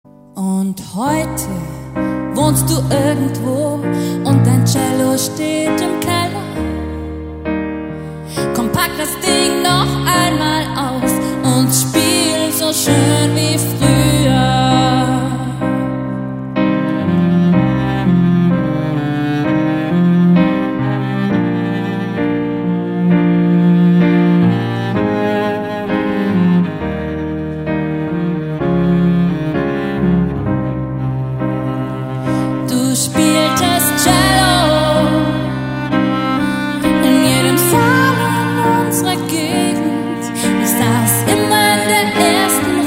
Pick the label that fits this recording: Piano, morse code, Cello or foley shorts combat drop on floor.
Cello